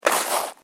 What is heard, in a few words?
SNOW SCRAPE